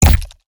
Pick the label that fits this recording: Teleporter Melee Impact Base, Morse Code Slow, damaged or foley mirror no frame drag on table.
Teleporter Melee Impact Base